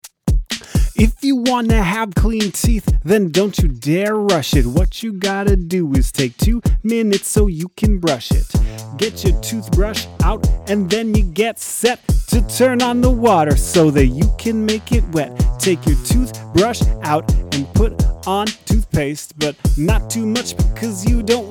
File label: Brush It Song